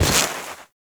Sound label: Step Snow, Foley, Footsteps; Enemies; Warframe; Duviri; Dax